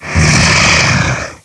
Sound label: Scrake Death